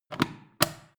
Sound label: foot switch turn on off depress full